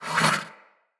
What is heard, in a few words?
Wyld Blind Shot; Fey; Saboteur